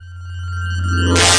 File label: harmonic distort; Scars FNC SFX